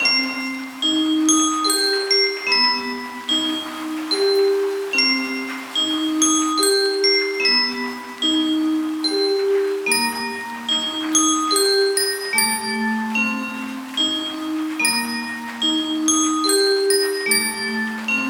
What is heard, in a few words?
Gloomy Musicbox; HORROR MUSIC BOX